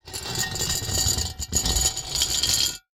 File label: Household Metal Pot Scrape On Cement